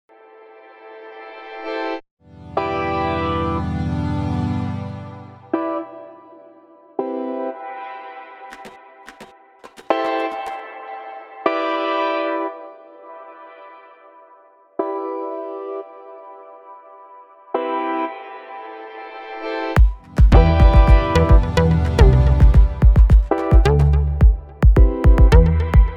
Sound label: Peace Chill Background